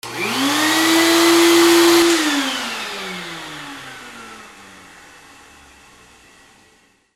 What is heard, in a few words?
GARAGE